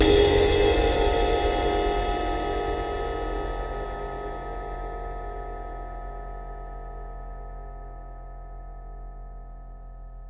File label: Cymbal Hit, Impact Drum Hits